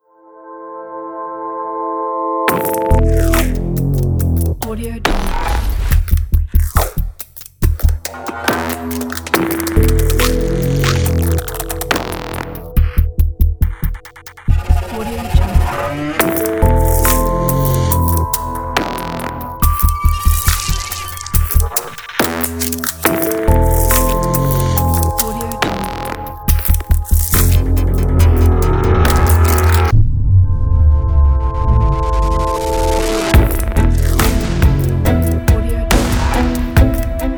horror glitch intro

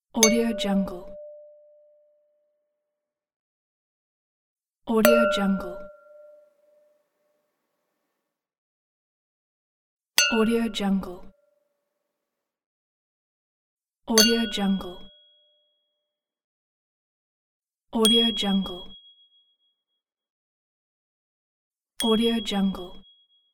stemware

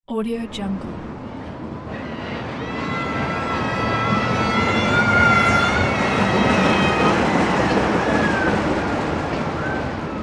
passing slow rollercoaster with people

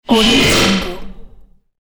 digital swoosh

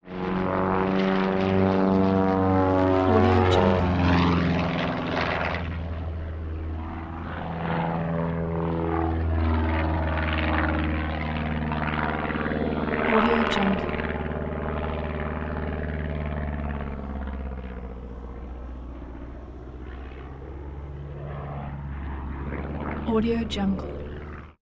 biplane buzzes propeller whir loops approach; prosoundfx

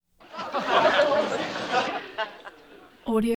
crowd small audience

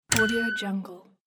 design push

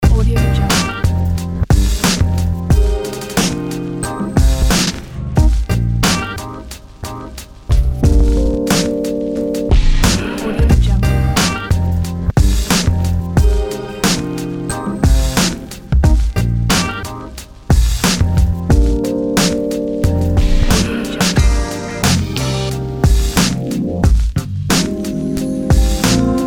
glitch hiphop ident

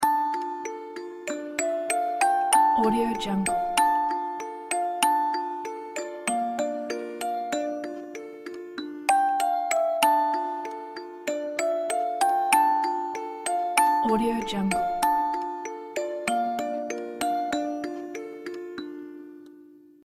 swan lake music box ident

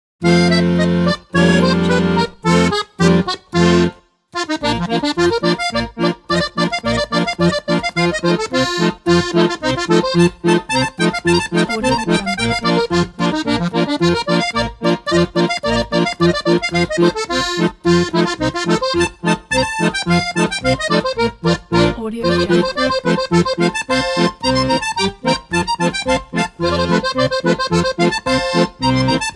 polka accordion solo

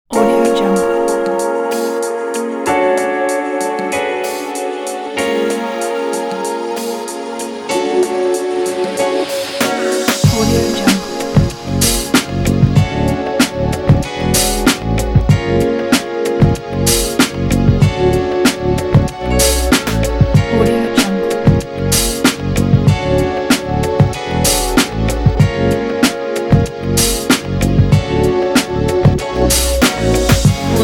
funk hiphop; sound circle production

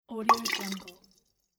opened hot water bag slosh

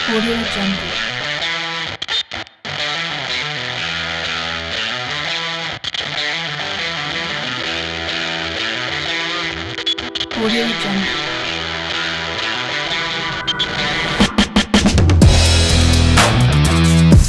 action sport rock

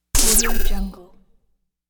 short glitch noise

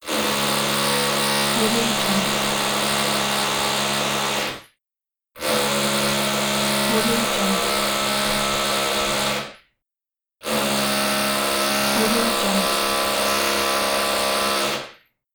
neighbour drilling

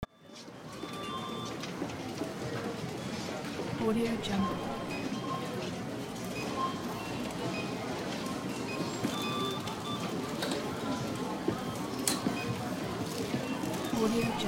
supermarket store ambience

